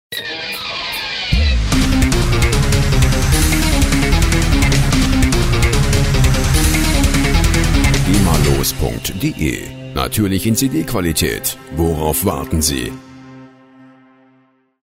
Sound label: metal tusch